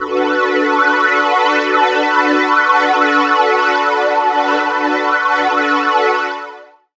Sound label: Wash Pad; Zero-G Jungle Warfare 1, 2 & 3; 90 s Sample CDs